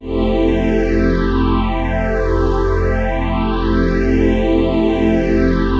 PHASE PAD, ATMO PADS; Best Service; XX Large

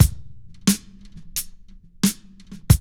LOOP; E-Lab; X Static Goldmine 2

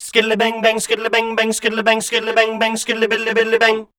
SCAT A

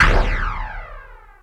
PERC; ZERO-G; Datafile

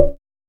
TOM

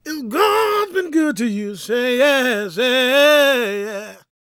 GOSP MALE, Vocal Planet Gospel

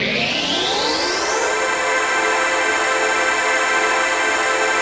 ATMOPAD; Best Service; XX Large; Pads

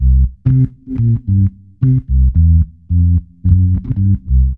ORGN BS; INSTR LOP; E-Lab; X Static Goldmine 2; akai

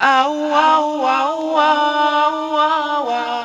VOX; ZERO-G; Datafile

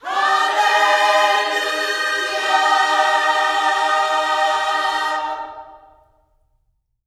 HALLELU, Gospel; Spectrasonics; Vocal Planet Vol.1 Gospel; HALLELUJAH

